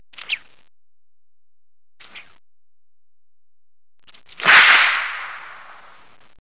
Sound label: whip